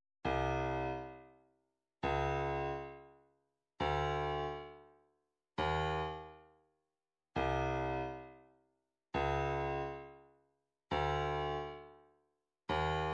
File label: 135 snare; Postal